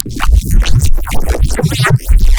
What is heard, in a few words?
Neuro Bass